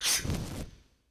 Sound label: Flamethrower Burst; Weapons & Explosions